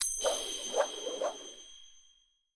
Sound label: Pick Coin Rotate; netent